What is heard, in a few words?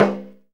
SNR HI VAR, VINTAGE FUNK; Zero-G; Funky Elements